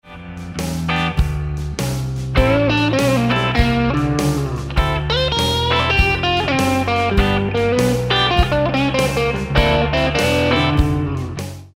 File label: Reconsider Lick, guitar